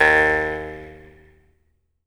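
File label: Jaw Harps, Pitched Percussion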